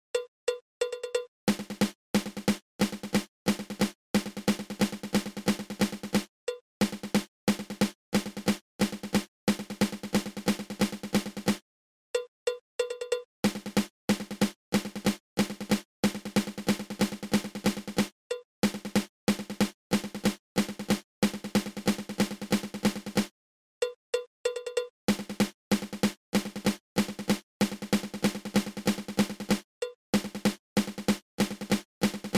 Flam Accent

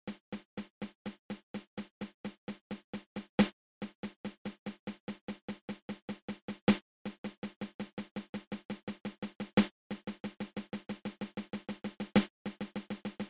Fifteen Stroke Roll open close open

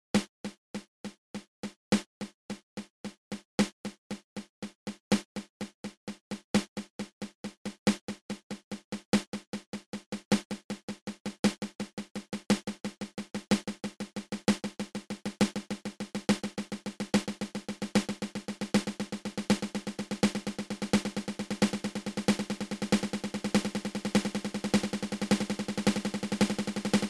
Paradiddle diddle open close open

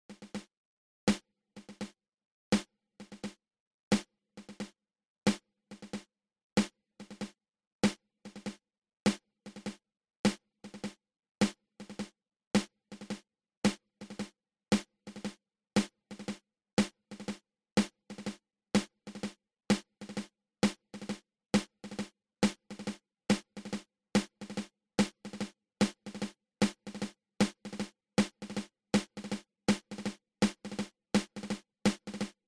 Single Drag Tap open close open

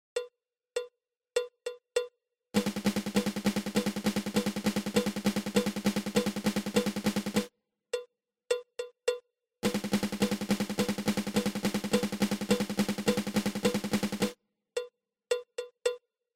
Swiss Army Triplet

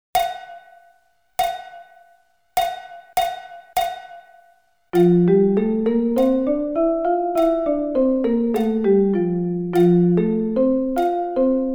F Scale

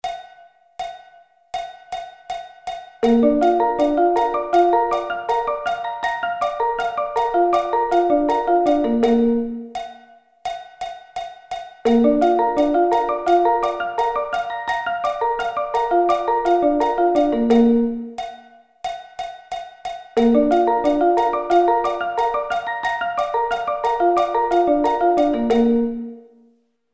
Bb Arpeggio Inversions

